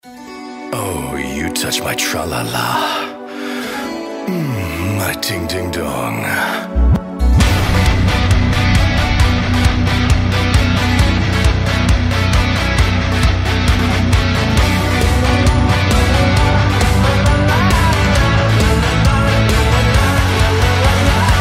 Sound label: metal cover